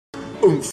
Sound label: oomf